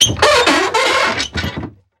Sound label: Industry Gate Metal Gate Squeak And Drag